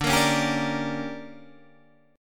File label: D Major 7th Suspended 4th Sharp 5th Guitar Standard, chord; strummed